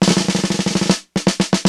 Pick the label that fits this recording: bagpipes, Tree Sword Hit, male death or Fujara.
bagpipes